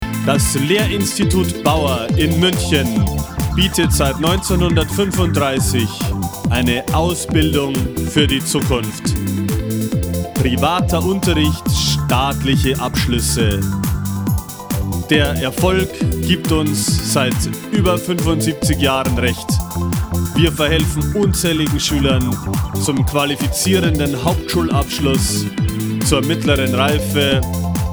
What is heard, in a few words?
Jingle